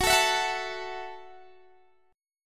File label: Gb Minor 7th Gb, Guitar Open D